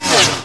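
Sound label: missile launch; THEXDER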